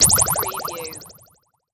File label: Hit Burst